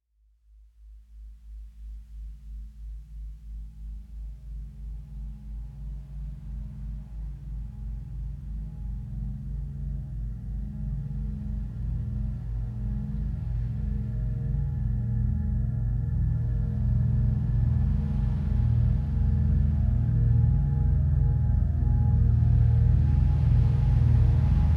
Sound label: Relaxing Spa Music, Ocean Chords